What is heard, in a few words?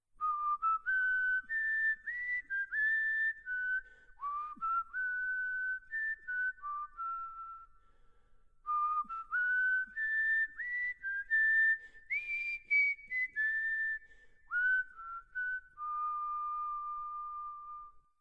whistle